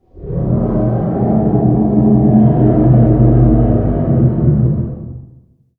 growl far; slender; garg

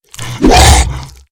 deathclaw attack; slender

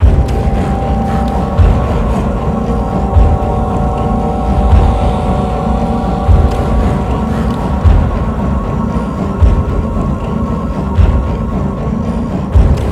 grunt theme; slender; amnesia

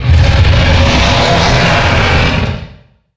roar; slender; garg

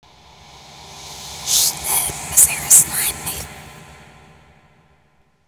reversed; slender

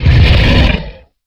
garg roar short; slender

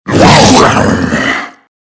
attack launch; slender; amnesia

